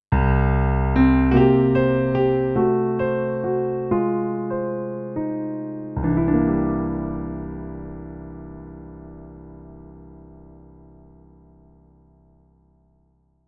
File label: Jazz Ending Logo